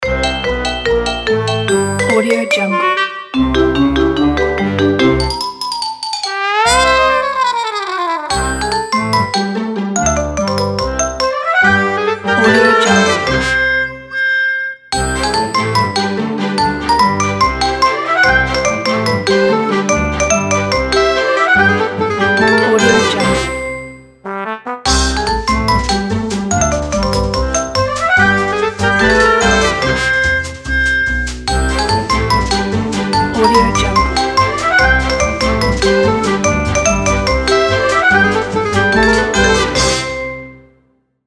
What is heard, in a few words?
comic relief